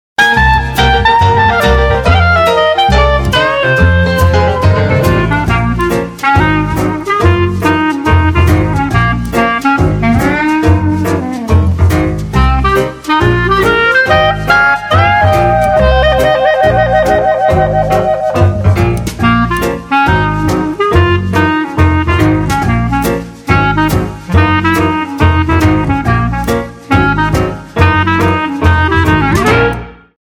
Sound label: HONKY TONK CLARINET